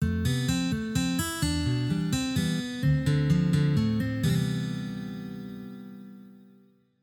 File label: gameover; umihara kawase